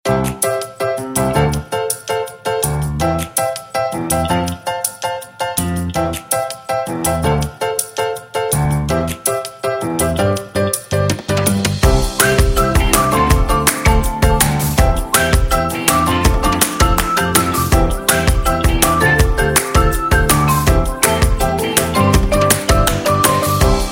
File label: Upbeat Energetic Fun Happy Kids